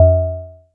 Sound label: DULL, PERC HITS; Zero-G Ambient 1